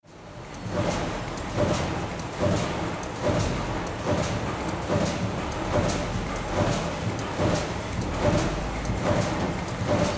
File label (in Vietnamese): Tieng on ao lam viec trong nha may cong nghiep